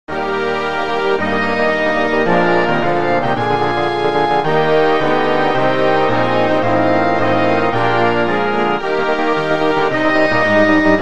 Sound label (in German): Marsch fuer Orchester